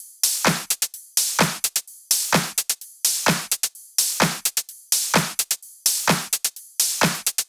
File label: 128BPM Emote Kit; Vengeance Samples